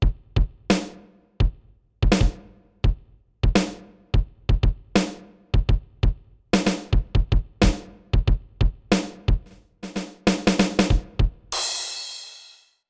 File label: rock; korg cliphit